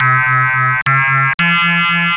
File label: pickup chaingun